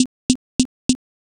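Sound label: footsteps leather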